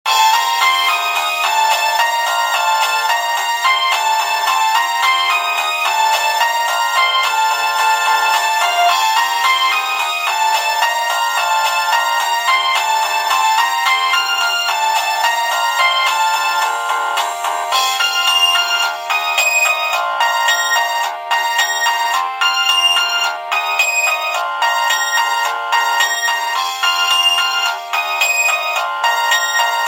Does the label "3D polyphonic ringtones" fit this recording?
Yes